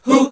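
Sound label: Gospel; Best Service Gigapack 1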